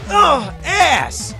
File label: rage; w00tguy; arcade